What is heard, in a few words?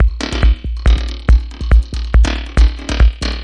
140 minimal ring flanger, drum loops and breaks